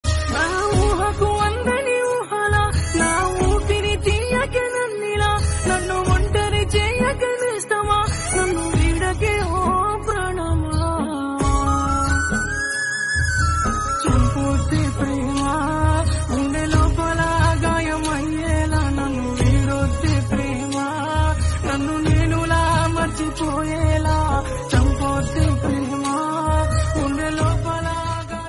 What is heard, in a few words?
Love Failure Telugu Sad Song